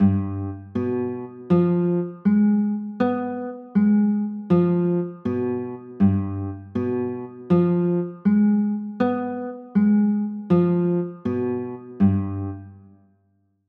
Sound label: g 7 b9, chords